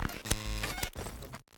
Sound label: Teleport Power-On